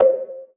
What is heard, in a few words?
Conga Big, 808 Congas; Slate; Vintage Drum Machines Volume 2 808 Edition